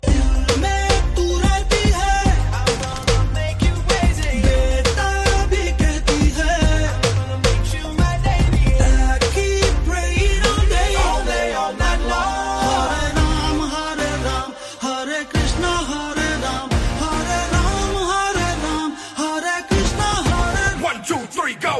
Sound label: Ringtone; bhool bhulaiyaa 3